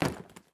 armor drop; Escape from Tarkov

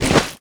dirt jump

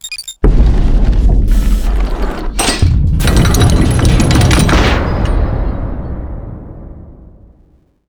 matrix door

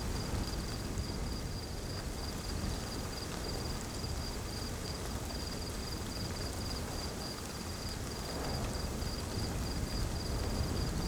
ambience forest night breeze drizzle; Rust

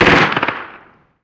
gun mp5 med

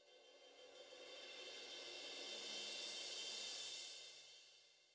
music interactive; World of Tanks

